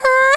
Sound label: cry C5; 音MAD; BanG Dream